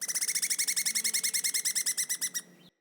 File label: Tree Pine Critter, Ambience Trees Pine; Midrath Island